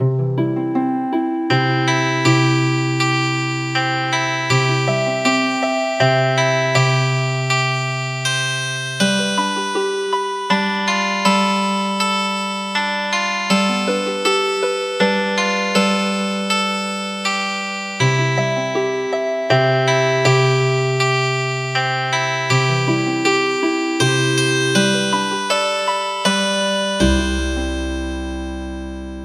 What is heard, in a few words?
Merry Christmas Healing Harp